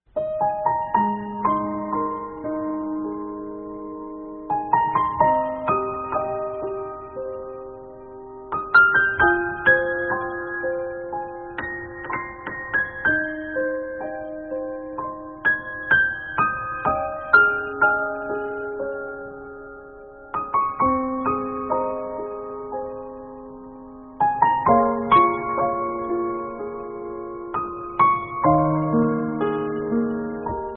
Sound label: background, notification